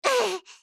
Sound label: Knocked Out; Juno